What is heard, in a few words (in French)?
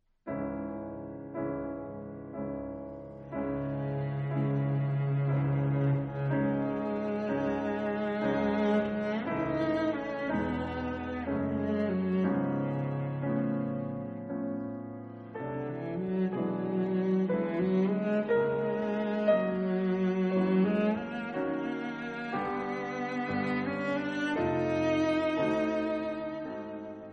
Quintette; Quintette en fa dièse mineur, piano et quatuor à cordes; 3. Allegretto grazioso